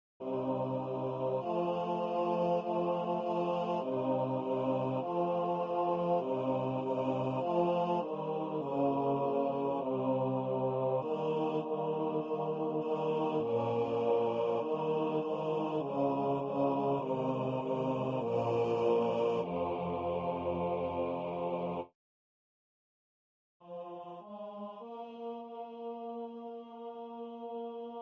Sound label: tracto bajo